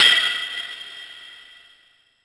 turbo sfx; drumkits; fl studio

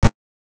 snare; goodtakimu drumkit; fl studio; drumkits

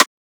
Perc

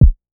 Kick; FL Studio; young kico drumkit; Chronicles Of The Atlantic Trap (Sound Kit)

